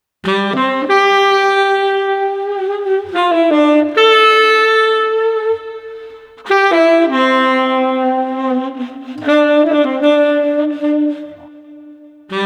Street Sax 115

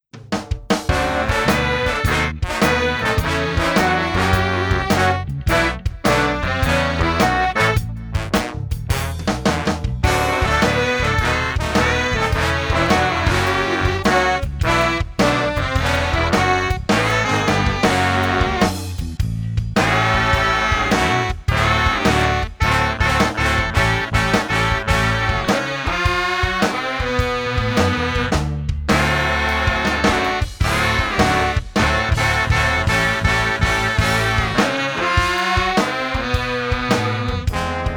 Brass Ensemble Tipsy 105

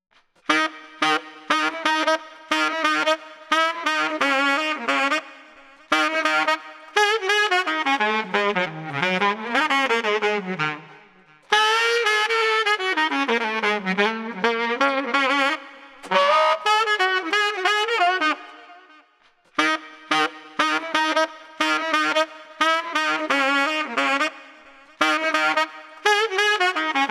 Street Sax Upbeat Jovial 88

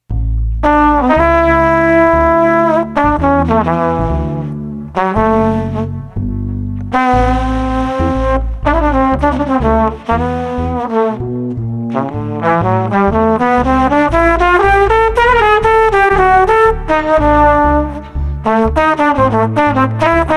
Street Trumpet Combo Cool no Drums 120

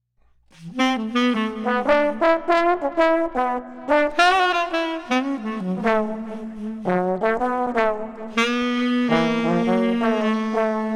Street Alto Sax Trombone Playful 110

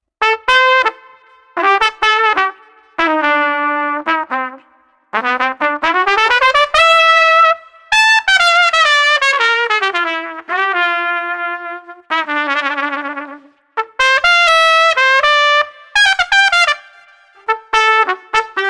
Trumpet Upbeat 125

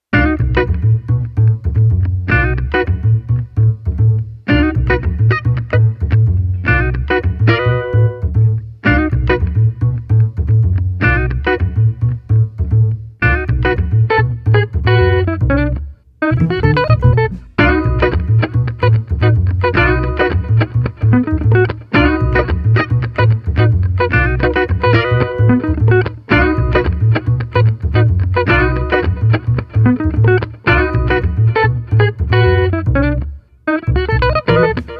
Guitar Combo Cool Funk No Drums 110

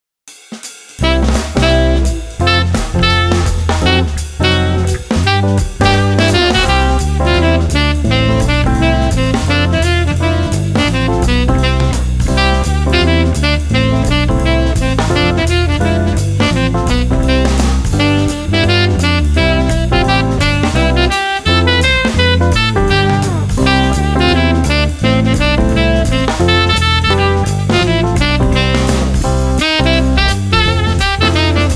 Jazz 170

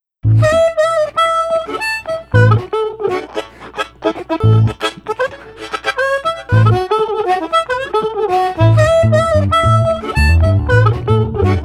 Harmonica Bass 115

